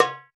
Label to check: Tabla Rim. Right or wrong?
Right